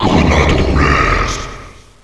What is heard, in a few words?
grenade; djeyl